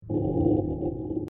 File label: roar viking